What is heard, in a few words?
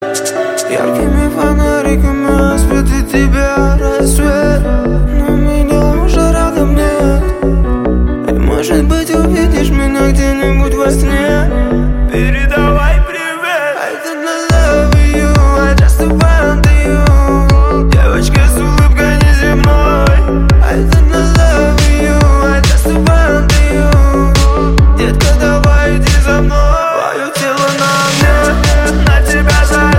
Groove